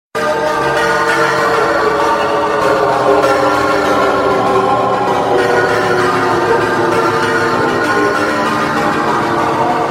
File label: horror; sprunki pyramixed